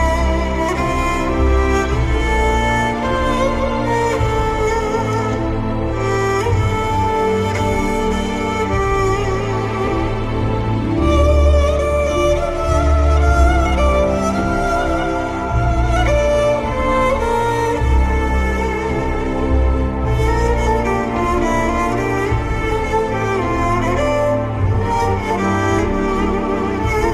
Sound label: Emotional ringtone